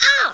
take hit; Tomb Raider PS1

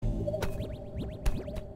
celeste sound wall to wall jump

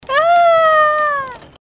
fall to death female

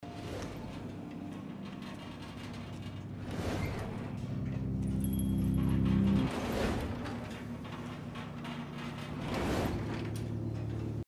soundscape desert; gris

